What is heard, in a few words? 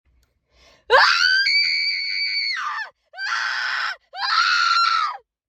frantic screaming; HAUNTED HOUSE 2024